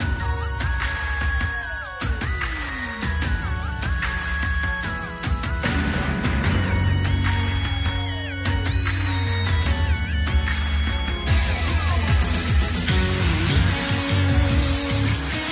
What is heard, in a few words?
Title Screen Music